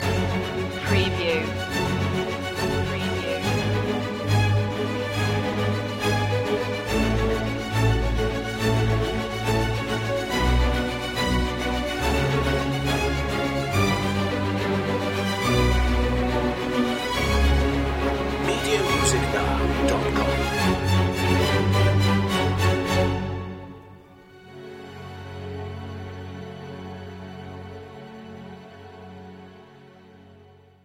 strings only

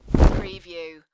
Monster Wing Flap

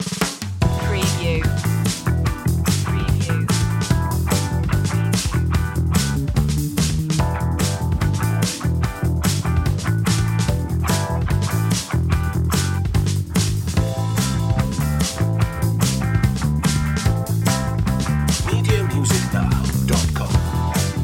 cool attitude upbeat

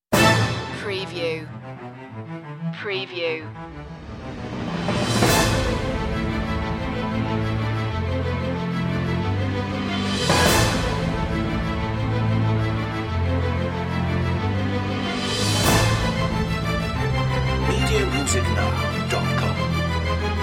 no choir

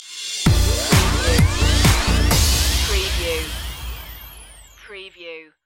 laserbeam stinger

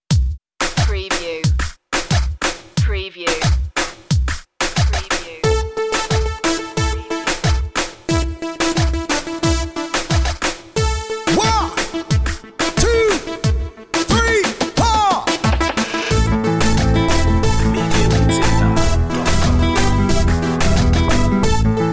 spanglish reggaeton

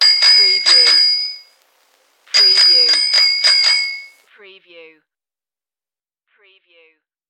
Bell Bicycle Old

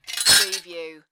Metal Chain Drop On Ground

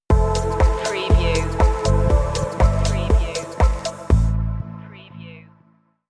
light spin stinger

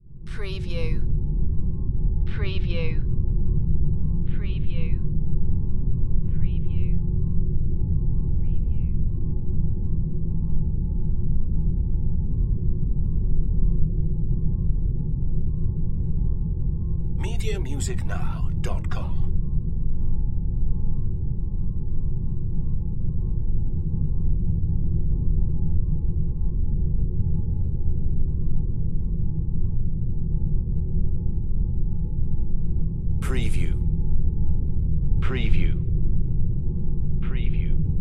Drone Ethereal; Sound Effects Bible

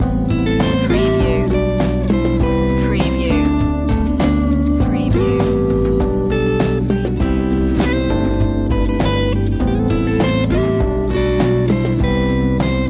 spaces loop

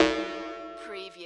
Space Scifi Sound oops strange sci-fi sonar

